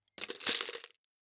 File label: Lots Of Tabs